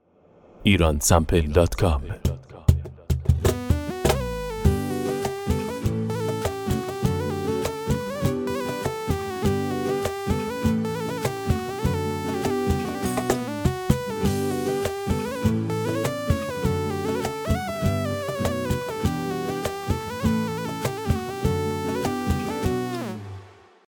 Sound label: cajon bandari, loops